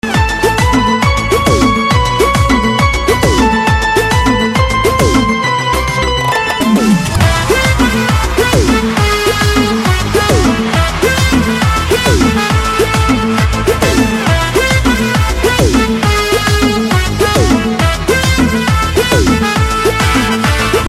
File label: Piano Ringtone